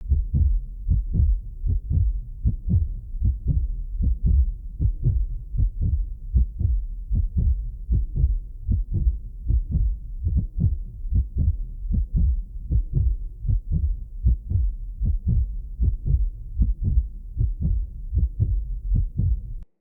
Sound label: Pulse Loop; Ambience; Bromius Inside